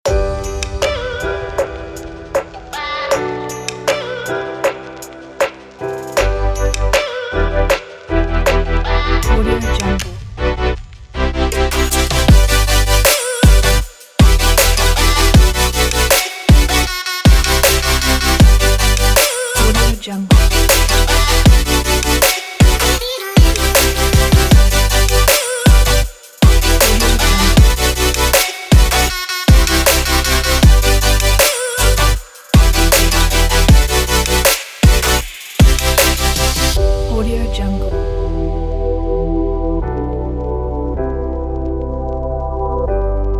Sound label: future bass; audioglow